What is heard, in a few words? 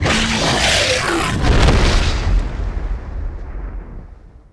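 death, sonak; giants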